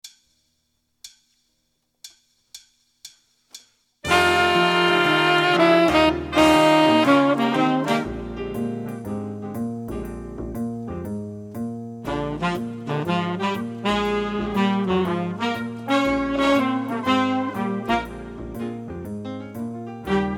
blues for improv, tenor sax solo

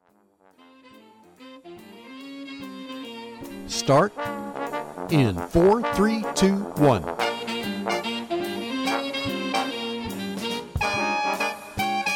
bass

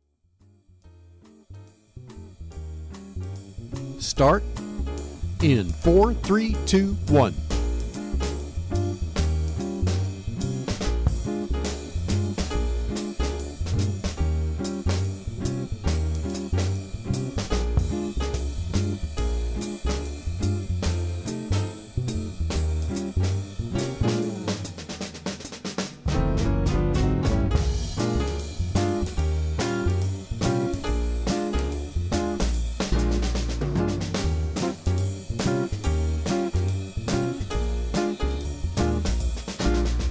winds

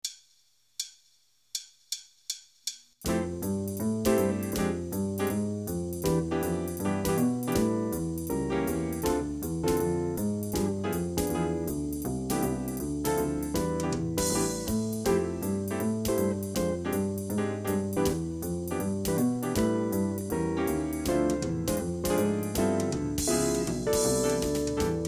winds cut, band

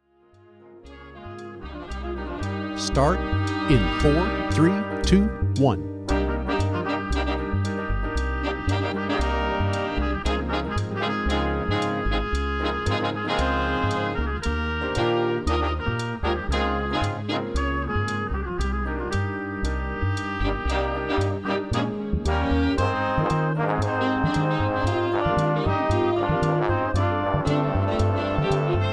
drums

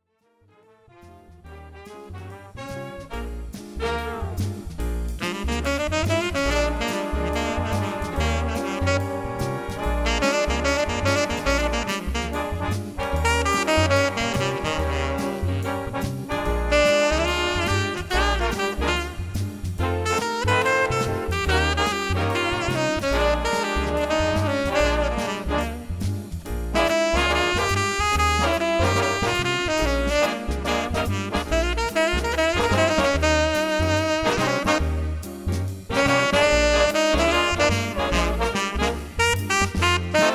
tenor sax

